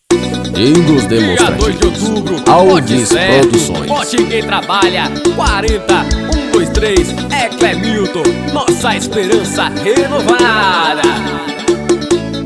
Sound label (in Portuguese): jingles; alves producoes; chefe e chefe romim mata